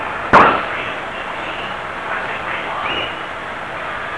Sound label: evp